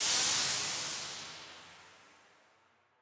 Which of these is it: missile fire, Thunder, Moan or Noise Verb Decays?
Noise Verb Decays